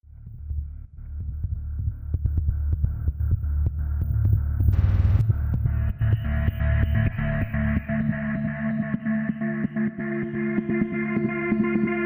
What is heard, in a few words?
Drone, Dynamic Synth